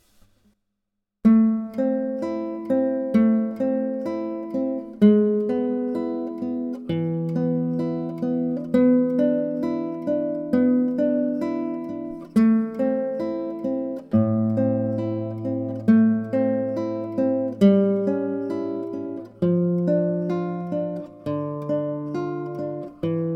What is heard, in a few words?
arpeggio